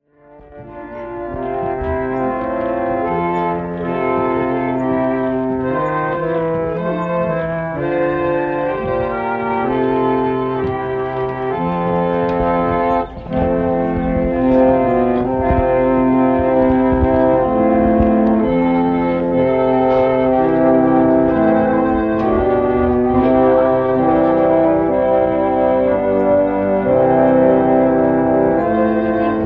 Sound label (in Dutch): orgel